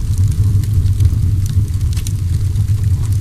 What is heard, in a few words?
fire, ambient